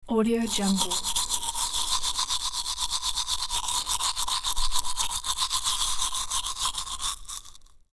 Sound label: slow brushing of teeth without water; hollywoodedge